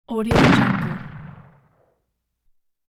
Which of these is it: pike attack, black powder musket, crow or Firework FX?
black powder musket